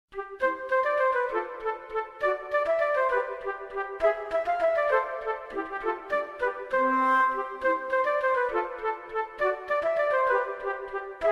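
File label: merry christmas instrumental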